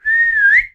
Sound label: whistle; kromers